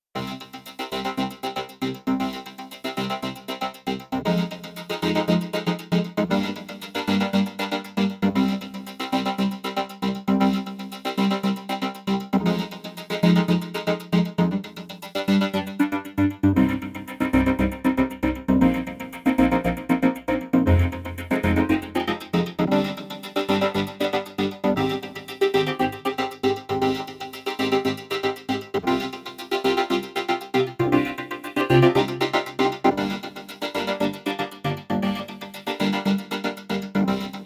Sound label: Codex Rhythm, Korg Radias-R